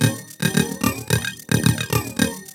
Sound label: LOOP, TECHNO; TECHNO-BOX; ELEC-LOOPS